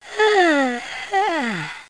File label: YAWN